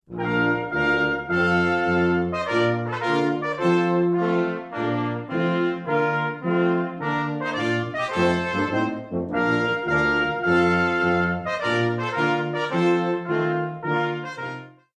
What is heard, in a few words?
Trumpet Tune; Trumpet Tune by Henry Purcell